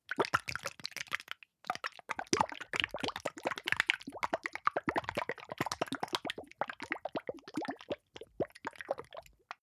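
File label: hygiene small shake long, Bathroom; haus-garten; badezimmer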